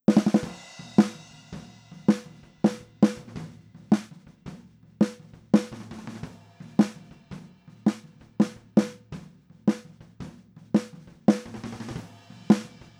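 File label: SM57 Snare Drums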